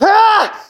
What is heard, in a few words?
hit